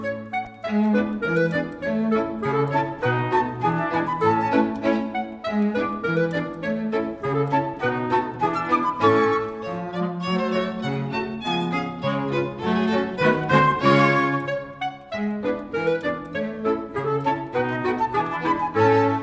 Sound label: Newport Polka